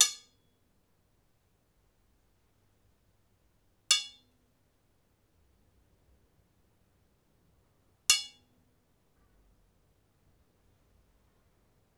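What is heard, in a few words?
3 hits iron bars cell C rec in courtyard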